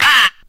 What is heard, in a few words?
"ah", Voice